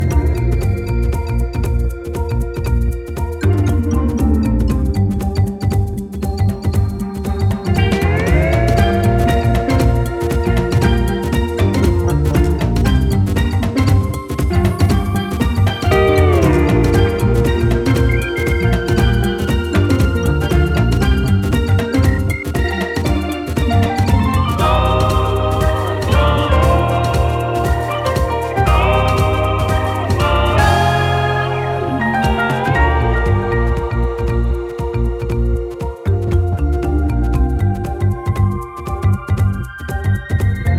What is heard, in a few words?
Bgm Underwater temple Fast; Toto Temple Deluxe